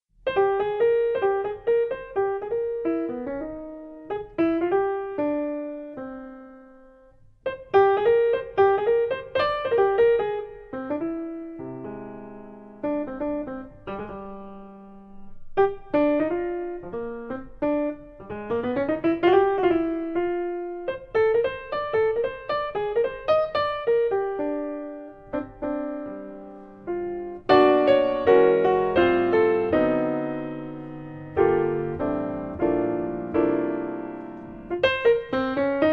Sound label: saintly horns